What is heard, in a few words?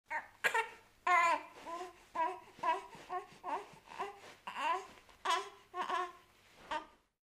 baby babble